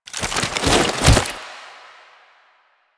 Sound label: Prone To Stand, Foley; Lasrian Lancer; Warframe; Lasrian Soldiers; Stance